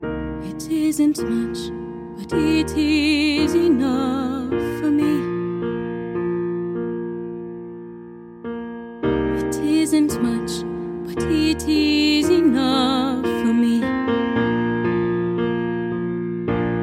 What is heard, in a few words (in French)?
chant